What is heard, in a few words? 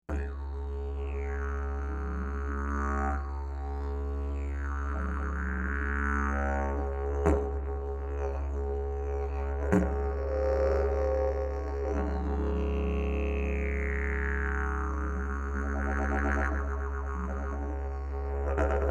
didge